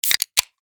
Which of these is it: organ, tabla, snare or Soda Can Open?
Soda Can Open